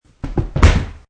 Body Fll Wod, Sound of a body falling onto wooden floor.; Body Falls on Wood 1; Wrestling and Struggling